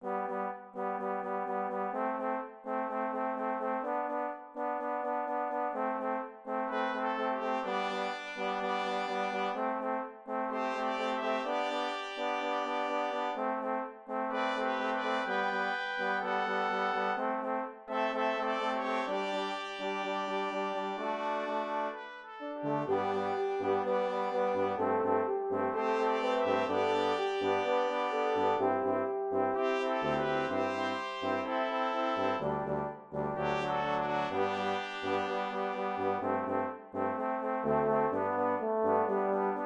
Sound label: Blessed Assurance Sextet, brass